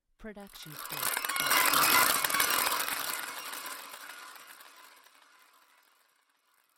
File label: horror chaotic horror; soundscrate